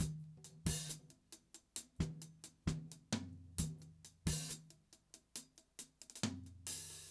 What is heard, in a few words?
hat loop